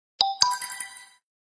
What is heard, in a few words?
Samsung Galaxy S10 Notification; tono de llamada